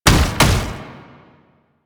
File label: Gallop, Foley; Enemies; Warframe; Proto Infested; Babau